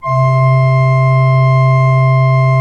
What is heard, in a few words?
SWL C2, Cathedral Organ, SCHWELLWERK; Propeller Island